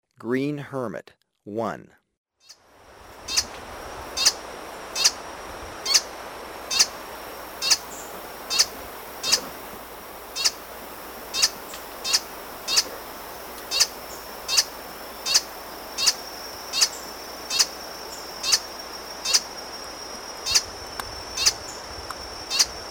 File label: Green Hermit; S. America